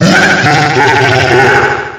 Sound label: attack; freak fortress 2; dry bowser